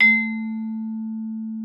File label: VIBES A3; Xsample VOL-4